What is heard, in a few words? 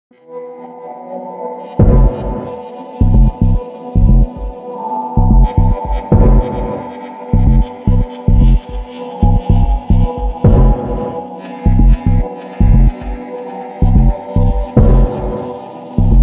Natural Healing